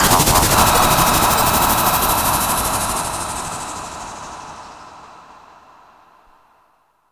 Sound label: SPACE-FX